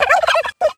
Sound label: gobble; garrysmod